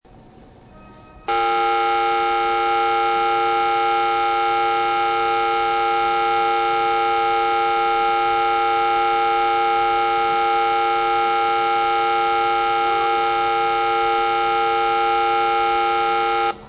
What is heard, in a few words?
bell, station; 3号線発車ベル; 阪急梅田駅